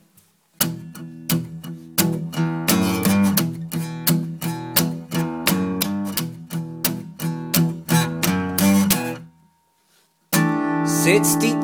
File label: Vinzirast Blues